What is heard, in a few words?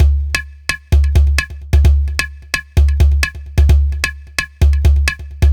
beats